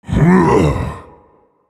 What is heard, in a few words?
Vocal Efforts Light; Colossus